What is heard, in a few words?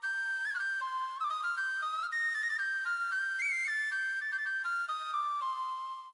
fest flute; dragon riders chronicles of pern 2001 dreamcast gamerip